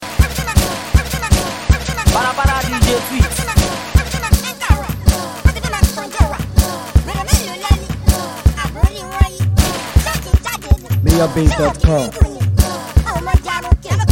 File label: Beat